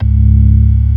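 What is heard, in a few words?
ORG. C#1; Best Service; Advanced Media Trax Modern Composer; B3 FULL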